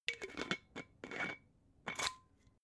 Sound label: glass bottle massive close extra slow